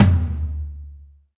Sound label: tom lo, Alesis DM5